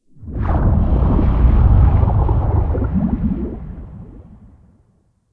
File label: Stalker Cave Underwater Whoosh; Ambience; Warframe